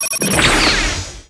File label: Enemy Ufoblock Divide Fly